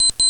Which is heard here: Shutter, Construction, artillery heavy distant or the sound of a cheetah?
Shutter